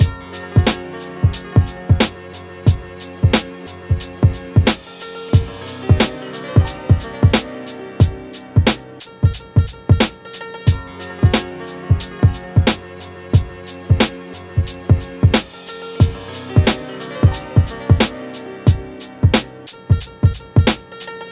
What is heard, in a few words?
beats